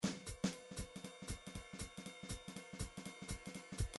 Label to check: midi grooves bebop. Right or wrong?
Right